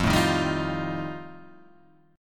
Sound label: F 9th F, chord